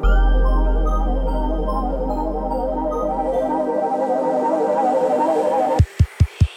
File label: Arp Garble; BB 2HTC Samples Vol 4